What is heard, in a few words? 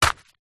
footstep single forest path track; zapsplat